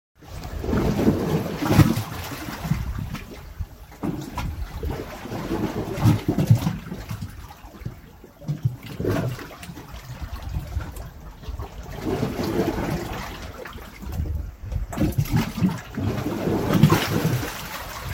Water At Beach

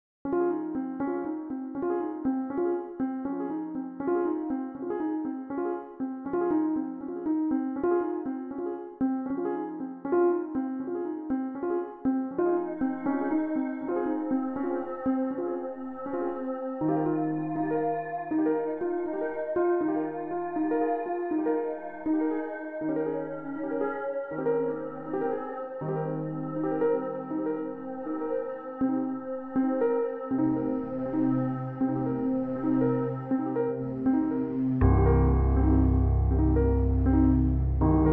Full Music; Full Music Track: generated entirely by audience input through the three sensors into the MAX MIDI machine

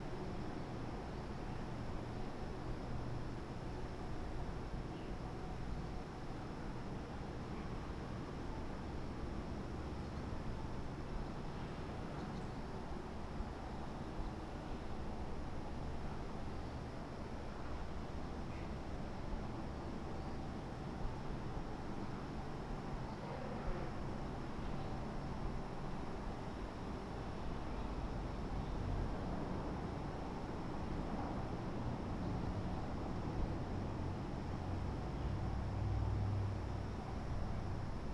Garden Tone, a more private and quiet soundscape; Local Gardens; Other small gardens were also recorded to capture a more private and quiet soundscape.

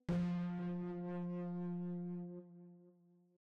Mushrooms Bloom

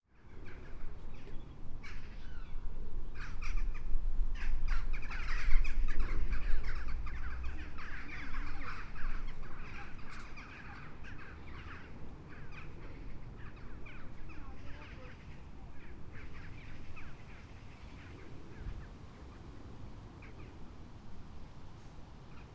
Birds park, Field recording; Arthur's Seat; Holyrood Park hill

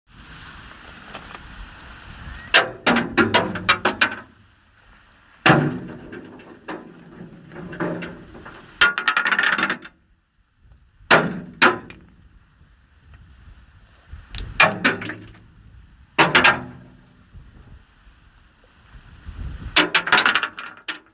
Stones

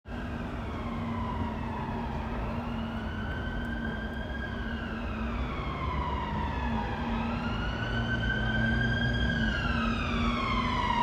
GREEN MEADOWS

